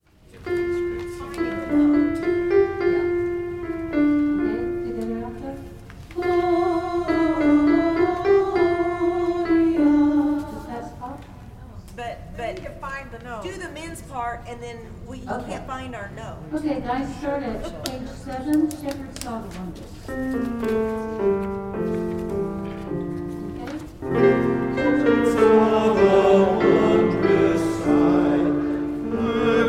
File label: alto; Gentle Mary Laid Her Child